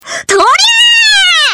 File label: grenade; csgo